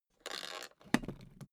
suitcase close latch lock slow

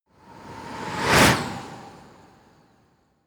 whoosh bicycle hard

